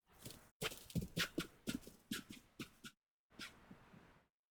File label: Fawn Jump Snorts; Echo Young Deora